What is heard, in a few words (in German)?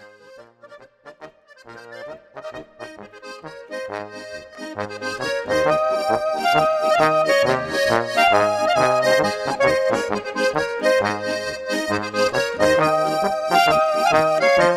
Polka